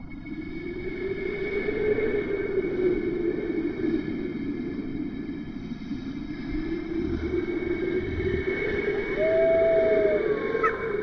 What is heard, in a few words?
wilderness ambience